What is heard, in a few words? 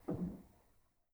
rel, Grand